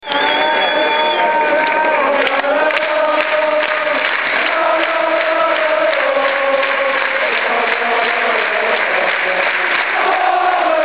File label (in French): Bordelais Allez